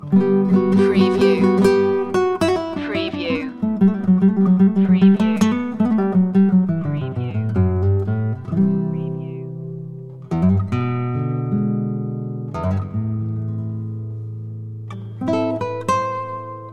bourree loop

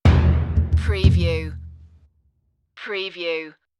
voodoo dance stinger

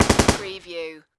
Machine Gun M240B Short Burst Close Perspective

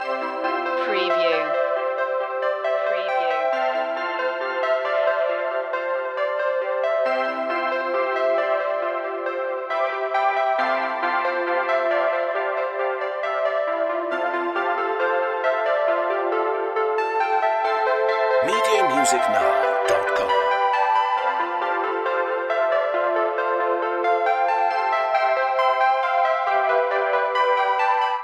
tones of aqua loop

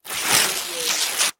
Paper Tear Slow Rip

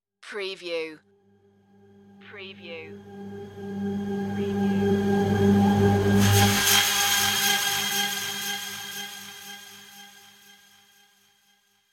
shiny gleam revelation

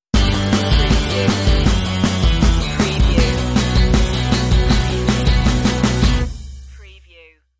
dirty fun stinger

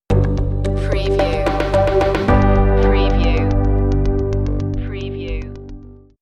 new technologies stinger

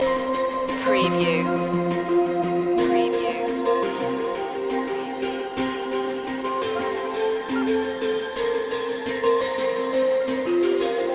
lounge isle loop

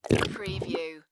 Mud Bubble

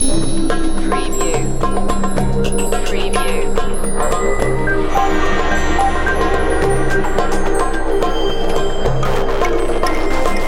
underworld series dramatic cue

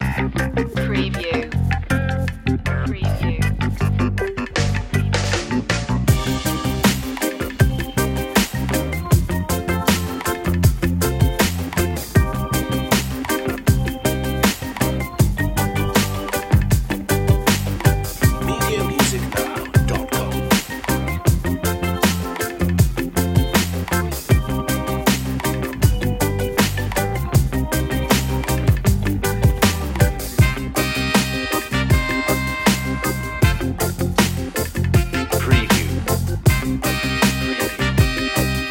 groovy jamaica

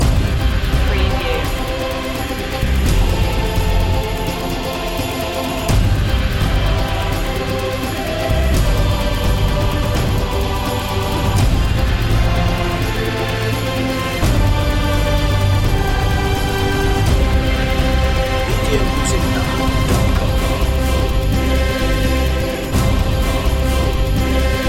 song of glory choir

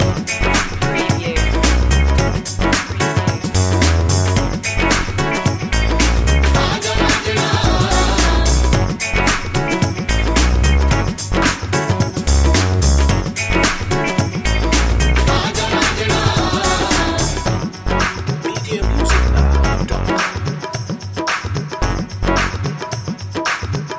mumbai groove loop

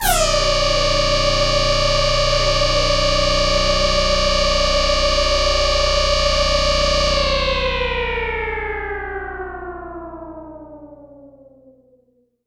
Protons Machine long decay long

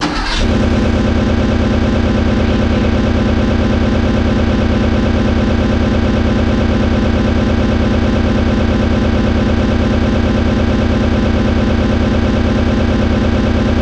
Car Starts

